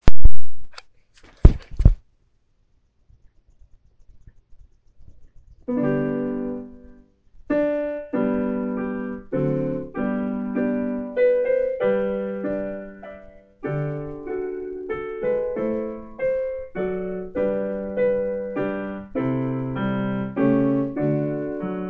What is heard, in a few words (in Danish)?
away - m. overstemme - akkom